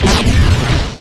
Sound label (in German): RAKETE; Best Service; Advanced Mega Effects